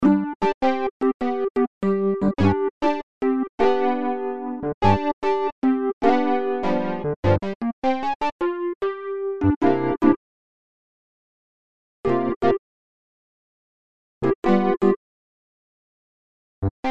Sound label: e piano loops